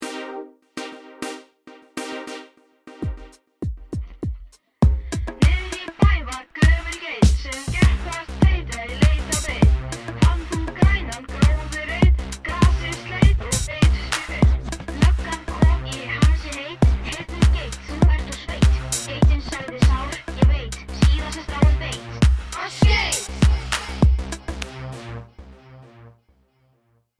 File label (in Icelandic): rapp; geit á beit; Verk nemenda; 5.-6. bekkur